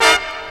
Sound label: tuners